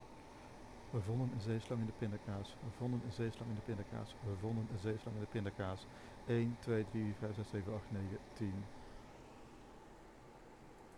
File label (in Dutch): Airco